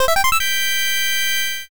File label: level up big